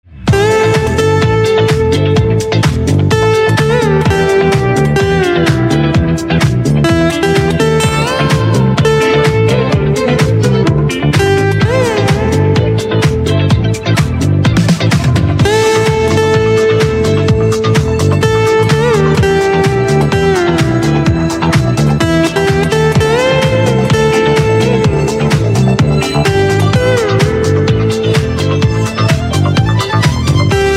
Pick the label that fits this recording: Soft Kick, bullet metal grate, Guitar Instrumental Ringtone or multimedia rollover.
Guitar Instrumental Ringtone